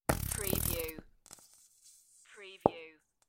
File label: Squeezy Honey Bottle; beanstalk audio